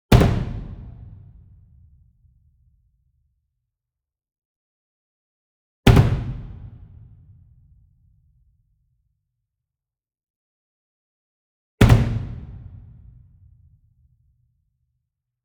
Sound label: Bass Drum Concert 42 Inch Flam Hard Beater Medium; SINGLE DRUM; boom library; Cinematic Strikes Construction Kit